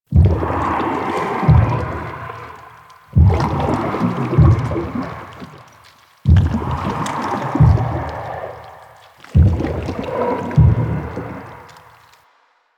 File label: Cyst Heartbeat Loop Large; Ambience; Props; Infested Eris; Microplanet; Warframe